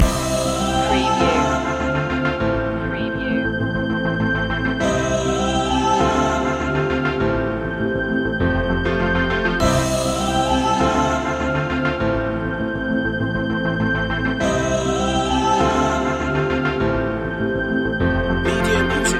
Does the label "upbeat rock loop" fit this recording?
Yes